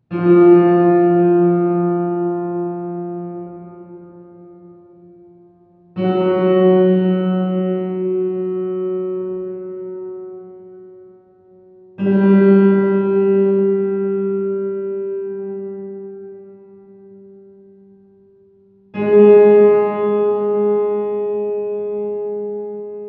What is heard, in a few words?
wet signal